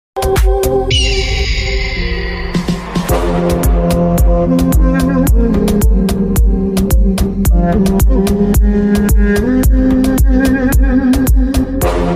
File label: bass boosted ringtone english ringtones